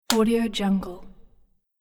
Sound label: slap smack sound